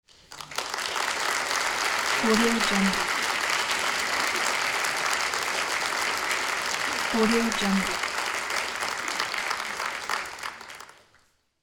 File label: clapping audience; fascinatedsound